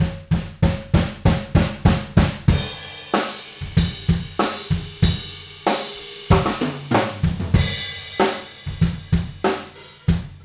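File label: Room Mono Cardioid, drum; drum shootout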